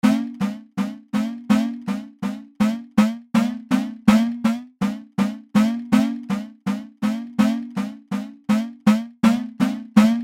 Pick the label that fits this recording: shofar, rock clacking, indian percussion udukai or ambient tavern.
indian percussion udukai